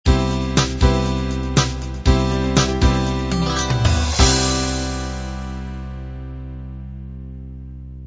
half 8-16beat end

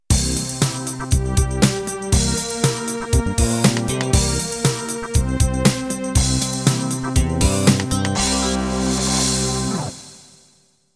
Hip Hop Soul end

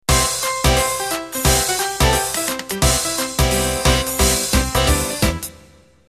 Flapper intro

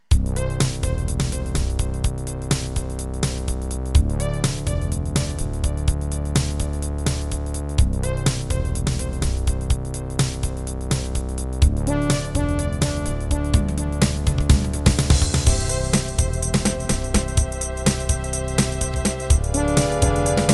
jungle

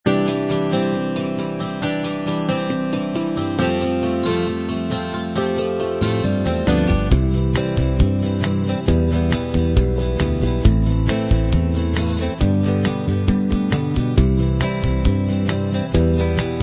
light rock combined

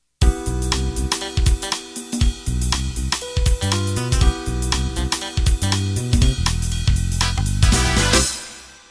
Jazz Funk End

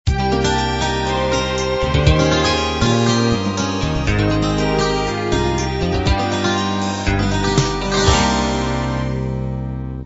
uk rb end

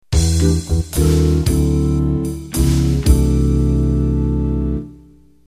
Jazz Vox End

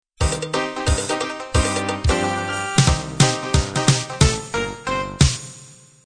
dixie intro

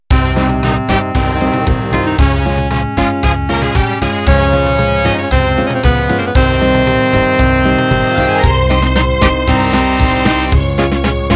Pasodoble Intro

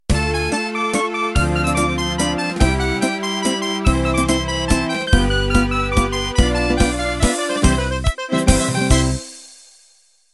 Waltz Italiano End

